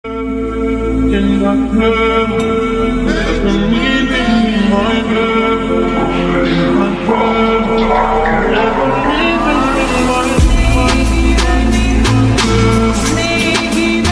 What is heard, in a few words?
slowed reverb ringtone lofi ringtone; viral bgm